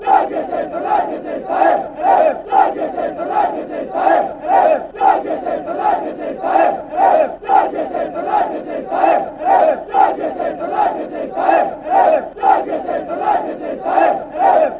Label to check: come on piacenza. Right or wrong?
Right